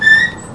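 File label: door open; My Science Fair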